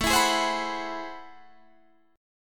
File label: A Minor Major 13th, down, Guitar, Open D